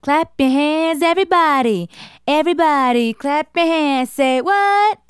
Female Solo, Hip-Hop and RnB Vocals

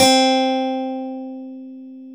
HARPSIC C4

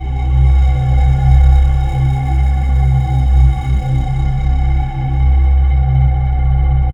Scary, nightmares; Dream Zone

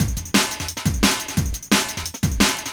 Breaks 175, Drum&Bass; EZ Rollers

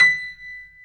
B5 STR PF, G.PIANO; AKAI S-Series CD-ROM Sound Library VOL-7

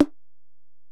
Tom, Alesis DM-PRO, Drum Machines, Toms

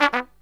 TRUMP, Killer Brass Riffs

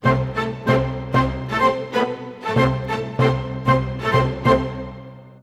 95 a min full mix, Orchestral arrangements

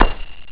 pling; ST-XX Amiga Soundtracker Sample Packs

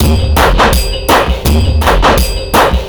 Beat, Futurist Drum & Bass; Drums & Percussion; Beats

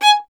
STR VLN, Solo Instruments; Miroslav Vitous

